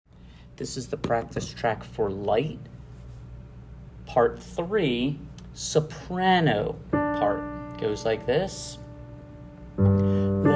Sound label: Light Practice Part 3 Soprano; 4th Grade Chorus; Practice Track Part 3, 5 and 6 Soprano